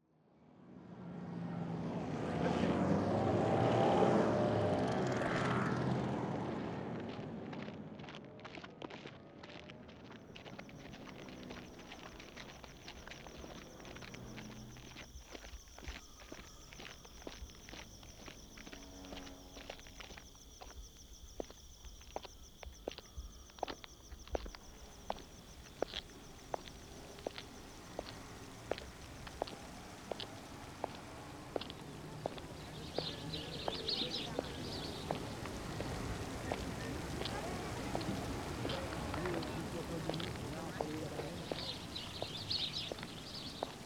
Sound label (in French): parc anglais; la spatialite du son geneve enquete dharmonie sonore; lauret